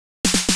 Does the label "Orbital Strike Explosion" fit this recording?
No